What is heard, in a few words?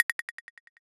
Rimshot, techno drum